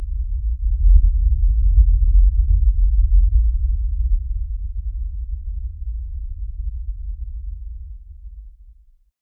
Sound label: deep atmospheric drone